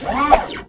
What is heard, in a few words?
ATTACK